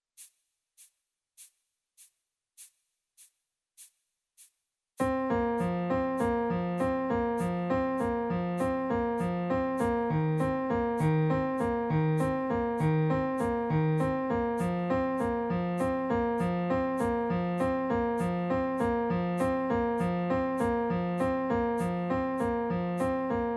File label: Arpe